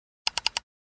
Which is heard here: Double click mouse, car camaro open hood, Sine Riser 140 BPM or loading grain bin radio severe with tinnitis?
Double click mouse